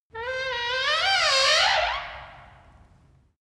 wood door old creak high loud

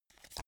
playing cards flip; comedy-cartoon; spielzeug

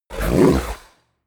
fishman snort exhale; kreaturen

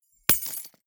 key ring large drop carpet hard

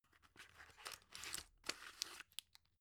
drug pull out soft; berufe; arzt

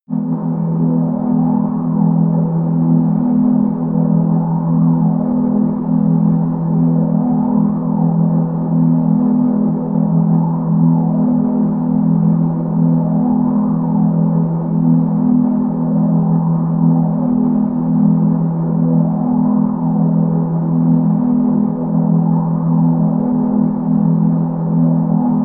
under water, music elements

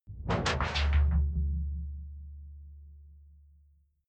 sci-fi alien tech big; swooshes-swishes

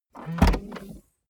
car bmw e46 exterior trunk open short

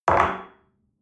espresso place on table medium

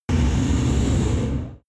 extrinsic drone storm; energie

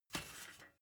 step ladder metal soft single; schritte

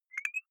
beep click high, signale

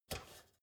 step ladder metal grip single; schritte

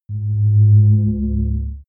sci-fi holo deck welcome; energie

